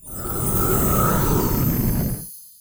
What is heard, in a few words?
hah